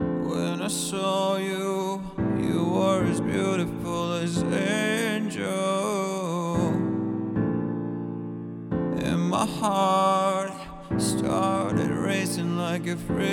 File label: slow funk vibes; intimate groove